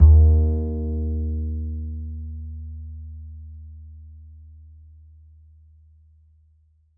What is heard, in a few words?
A, upright acoustic bass, A string